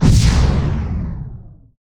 combat air laser; geoscape

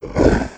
monster

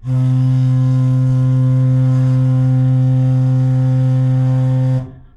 ferry arrive

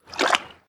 water slosh

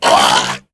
die, aliens; ufoai

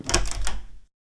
door open

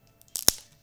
snap branch

